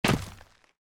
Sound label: taunt flip land